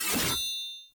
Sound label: Two Handed Axe Whoosh Stab; Weapons; Enemy; Axes; Sinecure Two Handed Axe